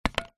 papadum set down on empty plate

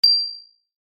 impacts metal ping high pitched small

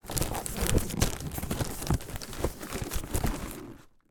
foley gift present wrapped box handling

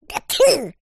cartoon character sneeze; zapsplat

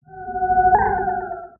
synth space weird synthy sci fi energy wave; Jessey Drake

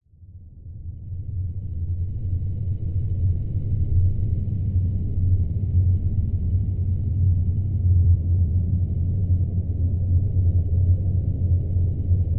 science fiction atmosphere drone dark hum; zapsplat

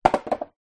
sport skateboard down on concrete surface

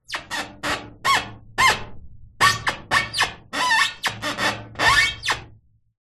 vehicle car tyre rub squeak metal

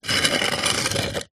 foley brick slide on other brick short movement

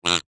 cartoon duck single quack; zapsplat

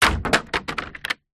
bottle plastic full drop ground; foley

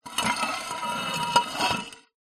glass sheet small square scrape on wood; foley; zapsplat

